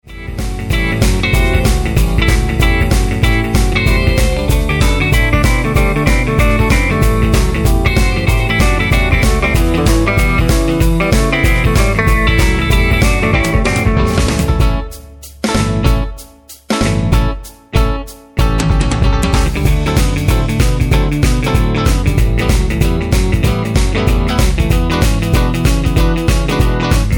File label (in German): mit Chor